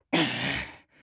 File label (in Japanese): ぐっ…